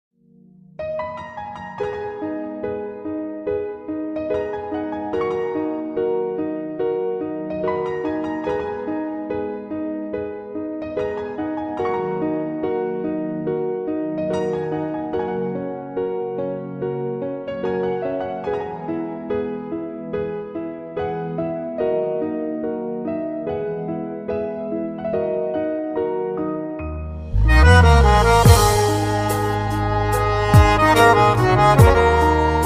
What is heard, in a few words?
intrumetal